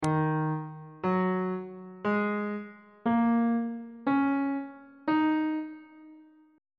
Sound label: d sharp minor pentatonic scale